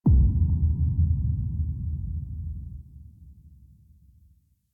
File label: Weave Boss Death End; Warframe; Cephalon Weave